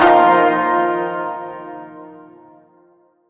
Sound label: Shawzin Blues Chord E; Chords; Warframe